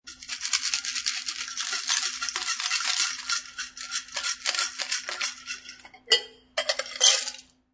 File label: Dumping ice from shaker in to sink.; Drink Mixing; Bars